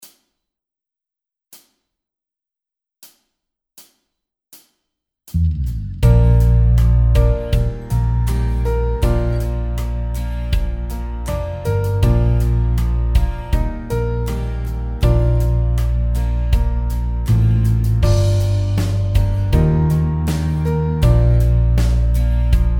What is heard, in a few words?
70 BPM Playalong